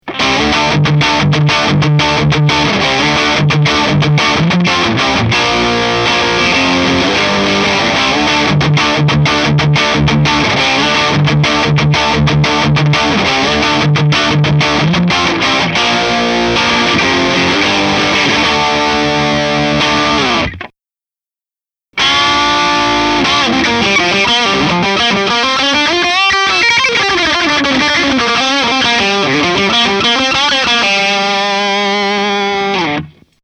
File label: V8 Ceramic mag